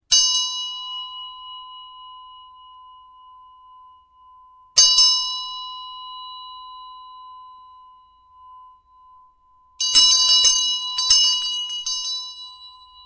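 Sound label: Tibetan bell; kolokolchik s vadjrom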